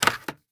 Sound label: Cartridge Fall; MiSide